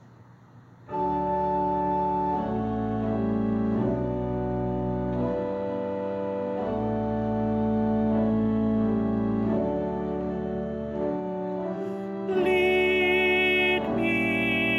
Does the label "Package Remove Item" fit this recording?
No